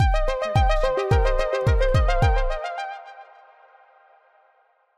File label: Synths and Groove Ident; SoundsCrate